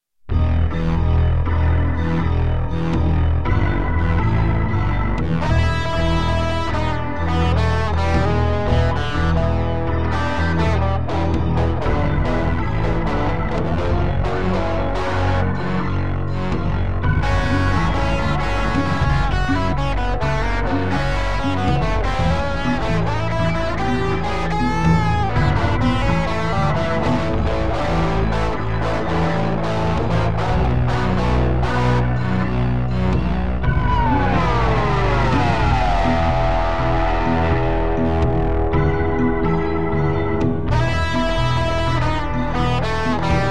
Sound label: rabbit boogie, guitar-overdub; featuring my first (rough :-)) guitar-overdub for 40 years; impro